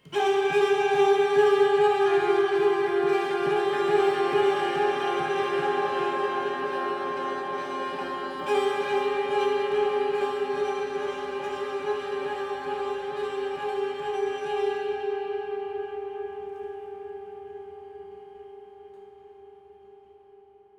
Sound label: CELLO